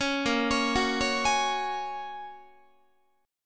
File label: A sharp Minor 7th Sharp 5th, Guitar; arpeggiated; 16,18,16,16,17,19